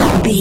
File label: retro impact hit